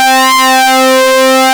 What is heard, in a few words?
SYNC SWEEP C2, ARP Synths, Analog Odyssey; E-MU Formula 4000 Series Vol. 3; ARP Synths II